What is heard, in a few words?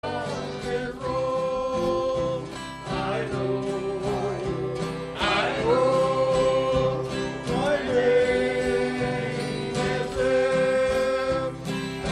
Choir